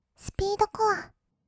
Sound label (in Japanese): スピードコア; 1200 kawaii voice!!; 音楽ジャンル 56種